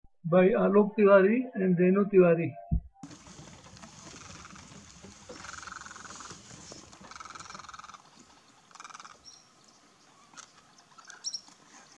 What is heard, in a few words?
Great egret call